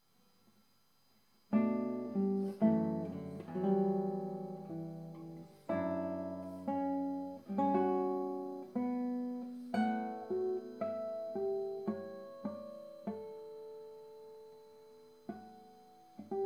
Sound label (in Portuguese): Acordes com harmónicos